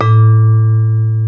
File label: vibes